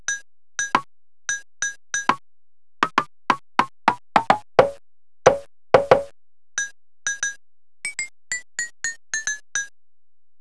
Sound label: Conga Slap Agogo Bell